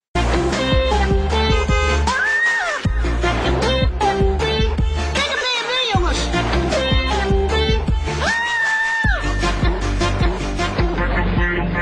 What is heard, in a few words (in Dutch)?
dikke bom hardcore